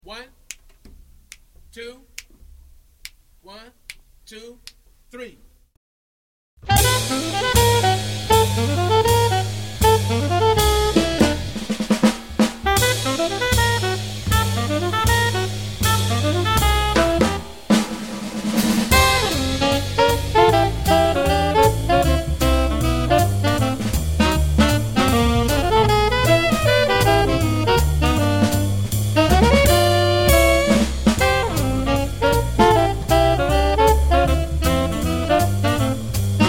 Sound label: minus piano